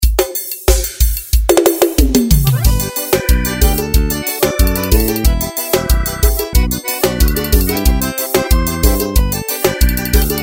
forro; kit 2015

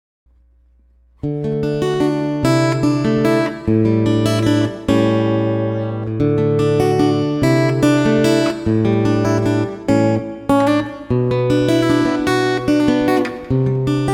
na memória instrumental

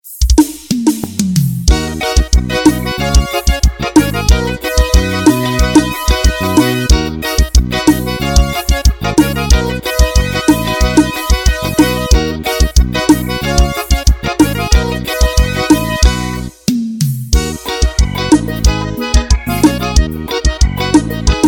teclado forro

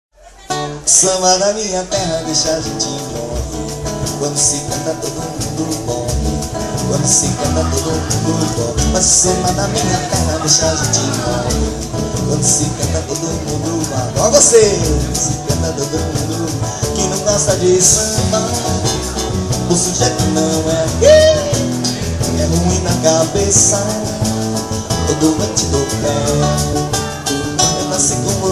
samba

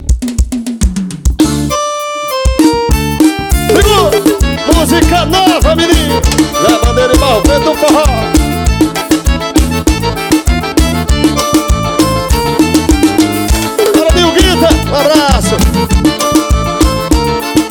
forró

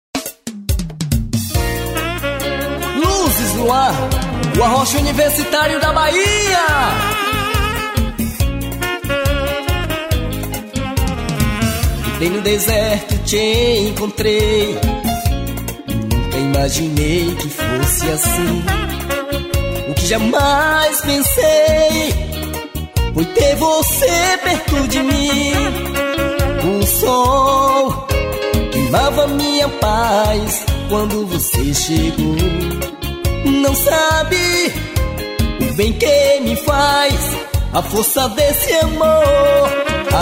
agua de oceano, no vocal

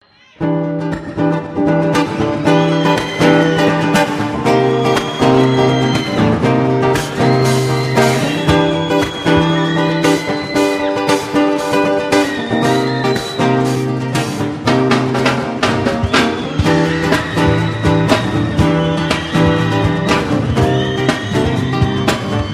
abertura do show ao vivo

